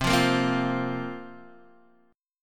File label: C 7th Suspended 4th, Guitar, Drop D